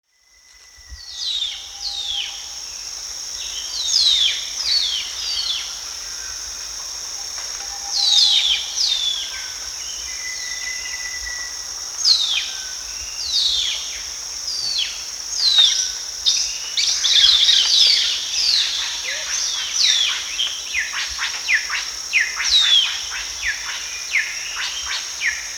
dusky broadbill

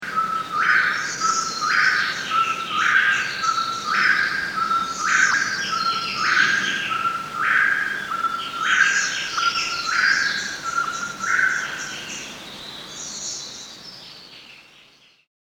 crimson-headed partridge

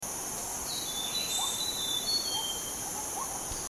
brown fulvetta

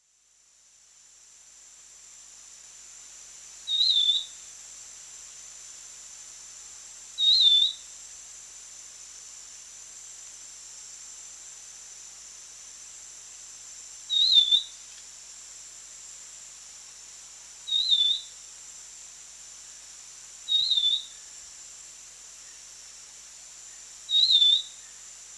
black-capped babbler

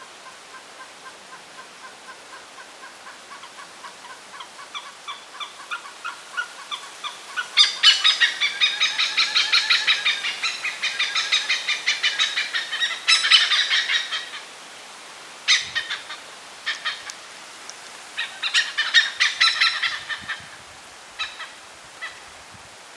oriental pied hornbill; SEPILOK

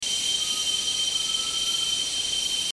bornean peacock pheasant

